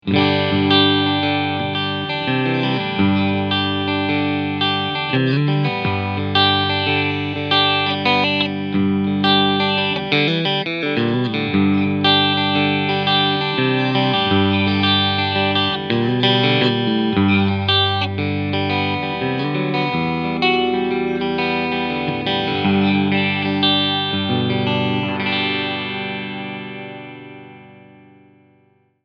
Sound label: Asat Special beide PUs Clean Sound; GL Tribute